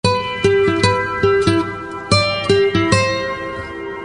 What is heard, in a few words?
melody